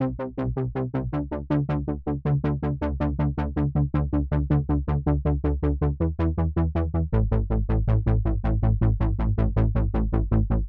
80, A, Syn Bass

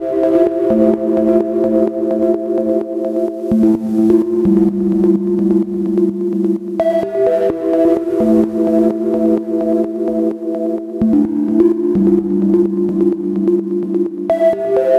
synth loop 128; Darkearth

